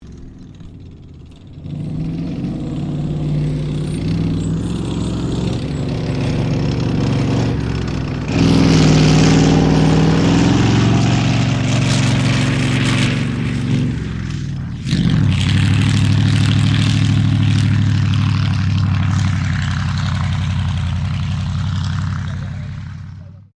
TANK M4 SHERMAN MANOUVER, American M4 Sherman Tank; Trucks; Vehicles